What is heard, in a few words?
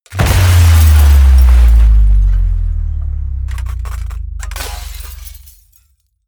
smash, baugewerbe